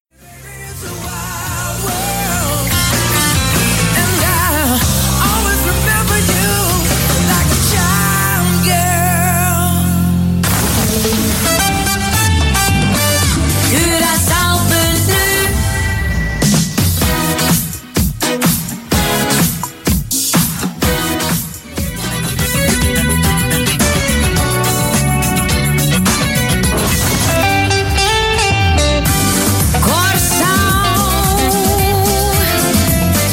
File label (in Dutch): jingles